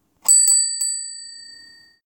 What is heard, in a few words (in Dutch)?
Station met signaal